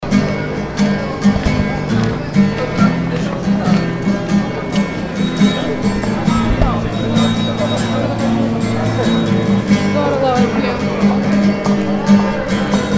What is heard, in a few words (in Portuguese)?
som guitarra; para conseguir sobreviver